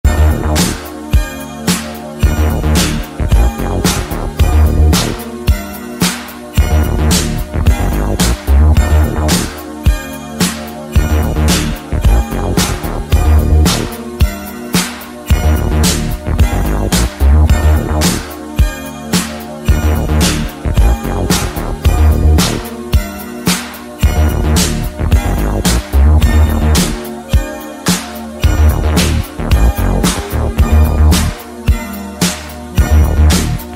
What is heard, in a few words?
instrumental